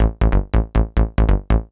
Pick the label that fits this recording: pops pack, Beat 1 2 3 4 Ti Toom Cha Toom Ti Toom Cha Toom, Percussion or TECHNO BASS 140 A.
TECHNO BASS 140 A